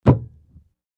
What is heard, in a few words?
Beer Mug Thump Clinks